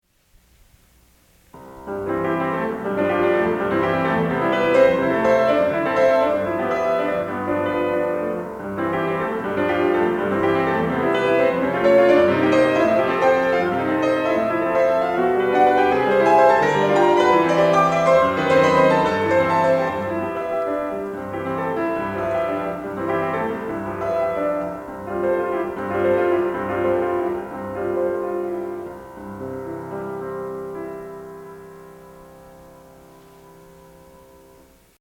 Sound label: Prelude No. 1 in C Major Agitato